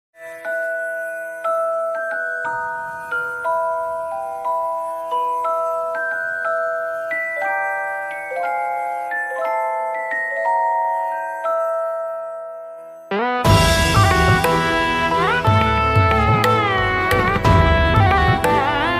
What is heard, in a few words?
indian version ringtone bass boosted